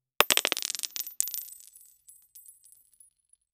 crystals falling on the g

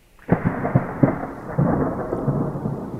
quick 3 5 thunder claps; arcing

